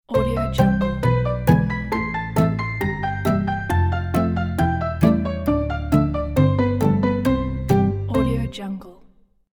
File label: acoustic logo